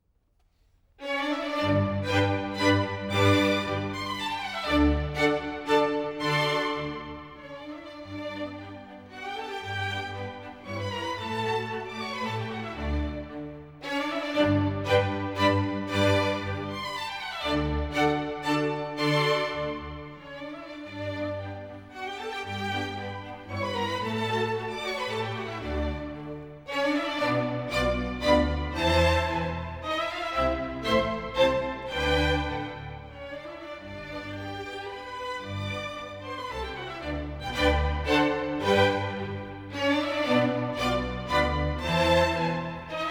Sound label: Minuets and Trios for string quartet, Menuett in G major